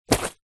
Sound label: purse pouch leather containing coins and notes drop carpet; zapsplat; foley